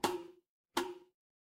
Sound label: cartoon bonk